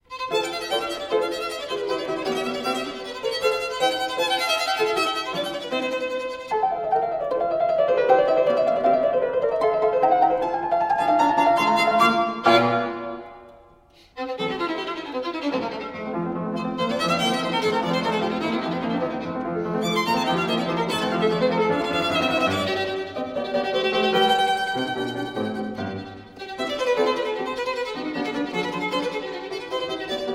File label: sonata in f major presto; sonata no. 1